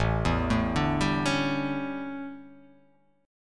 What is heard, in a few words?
A 11th A down, chord; arpeggiated